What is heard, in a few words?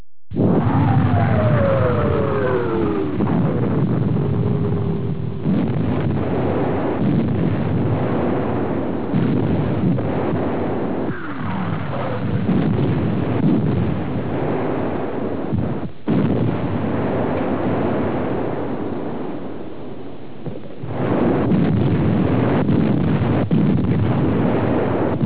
battle sounds